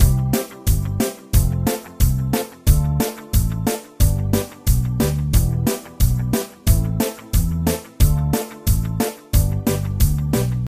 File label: Music Loops; Kenney